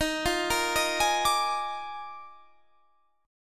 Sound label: Eb Major 7th Suspended 2nd Suspended 4th; 23,20,20,20,21,22; arpeggiated